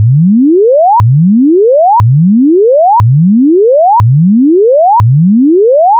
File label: 10Hz to 1000Hz cycle without stops log